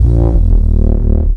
Rock Bass, Jungle; M8

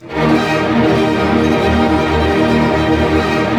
ARP D# MJ FF, STR ARP MAJ; Sound On Sound - Peter Siedleczek's Orchestra

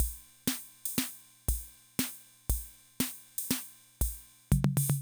Loop, rhythm 55, classic machines

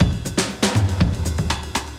breaks, 120bpm; free bespoke breaks; BeatStyleG

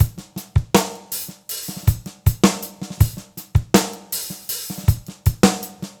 Subcity 080; Zero-G; Monster Beats

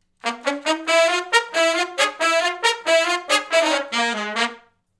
R B Riff (Bb) uni to har, Horncraft for R&B; Sections

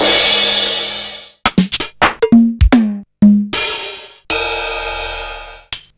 Akai MPC500 El Em 2

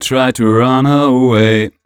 m 127 mel a, Vocals

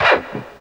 SCRAPE, Guitar

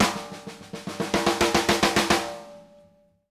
DYN SNARE R, drum fills; Sampleheads - New York City Drumworks VOL-1

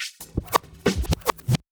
Vocal Scratches 140BPM

